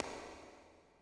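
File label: echo run, footsteps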